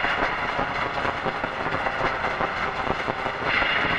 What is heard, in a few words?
Noise Loop, sparse soundscape